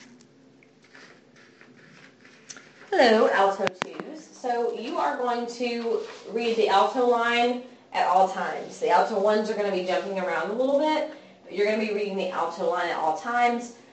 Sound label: alto